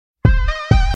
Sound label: blackberry ping, sms; ringtones